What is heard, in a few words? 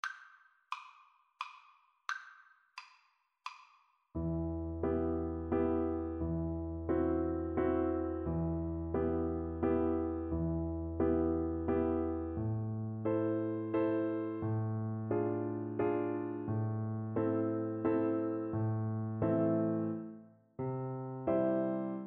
waltz flute